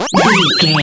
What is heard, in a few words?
retro powerup collect